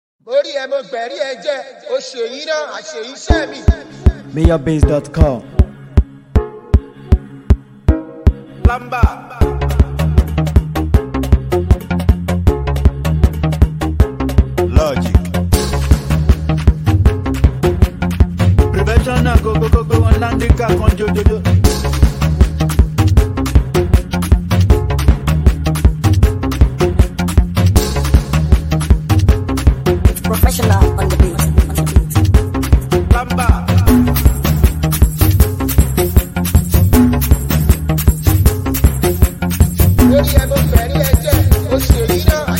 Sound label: Professional Beat, Lamba Move Beat